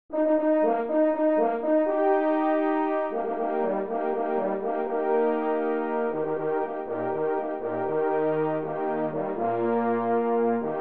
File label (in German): fanfare; in es